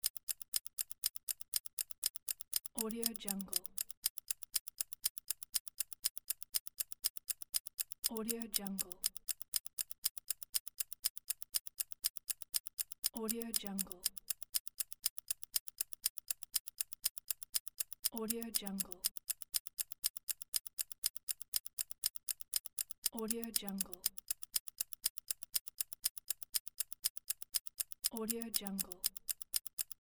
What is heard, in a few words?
clock ticking loop; mega loop